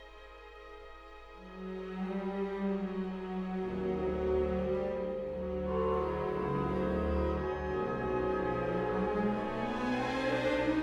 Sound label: Orchestra